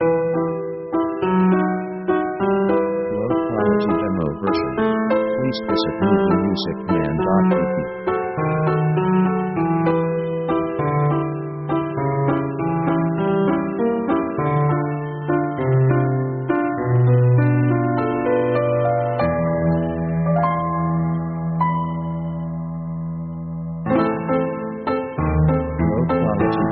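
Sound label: orchestral spanish marching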